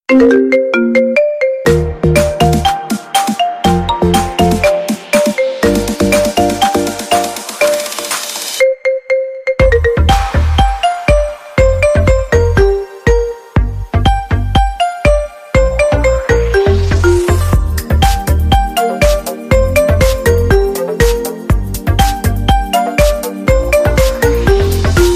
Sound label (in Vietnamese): nhac chuong iphone remix organ mix